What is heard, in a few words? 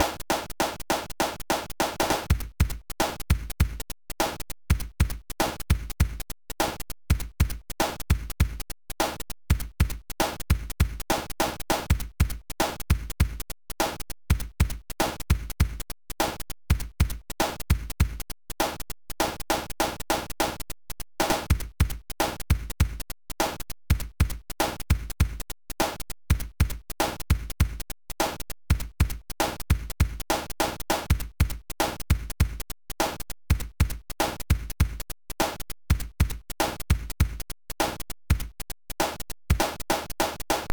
spring drum